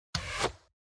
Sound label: shooting an arrow; baldursgate